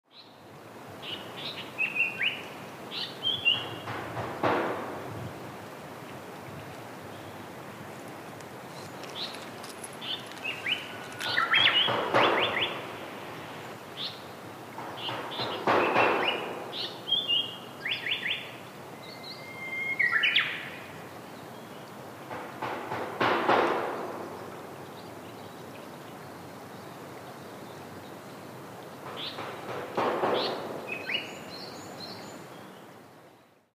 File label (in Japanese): sanko